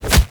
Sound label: Charge Body Impact; Warframe; Purgatory Warrior